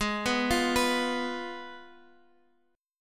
G sharp Minor 6th, Guitar Open F; arpeggiated; 20,18,17,18,x,x; G#m6

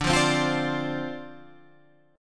D-Minor 7th D Guitar Open G, chord

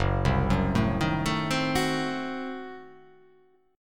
F sharp Diminished 7th F sharp

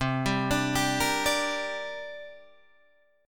C 7th Suspended 2nd C down Guitar Drop D

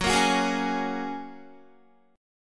Gb Major Add 9th, down, Guitar; strummed; JGuitar

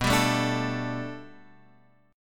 B Minor 7th Sharp 5th B, chord

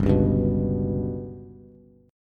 G Major Flat 5th Bass, chord; GMb5 strummed; JGuitar

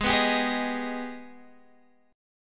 Ab Minor Major 7th chord

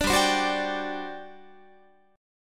G Major 7th Suspended 4th, Guitar; GM7sus4; strummed; 17,15,15,16,18,18

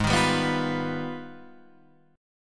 Ab Minor Major 13th down Guitar Standard; strummed; JGuitar

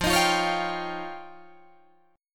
F 7th Suspended 2nd Sharp 5th, Guitar, Drop D

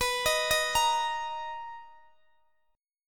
B Major 7th, Guitar Open D chord

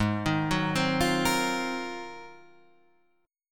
G sharp 13th, Guitar, Standard; arpeggiated; JGuitar

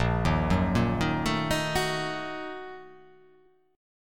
A sharp Major 7th Suspended 4th Sharp 5th

